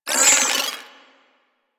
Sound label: Flare Impact; Warframe; DE; Sentients; Sentient Trooper